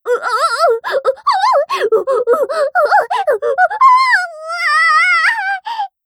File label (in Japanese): 喘ぎオホ